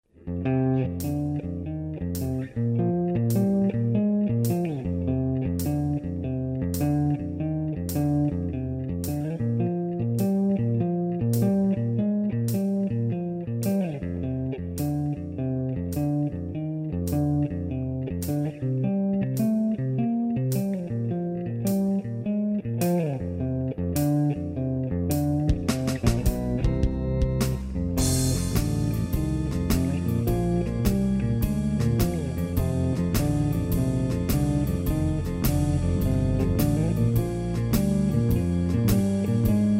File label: Honky Tonk